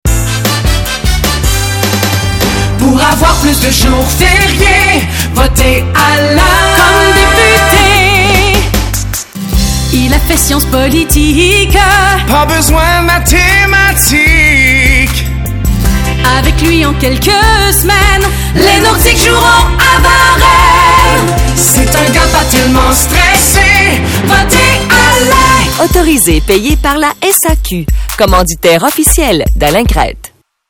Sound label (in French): jingle pub